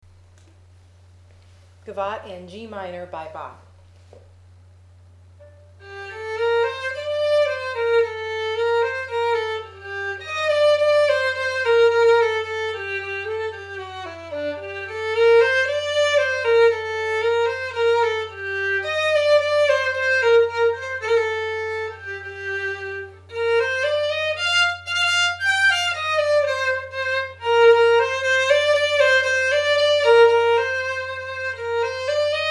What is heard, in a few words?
Gavotte G minor; Suzuki Book 3; Bach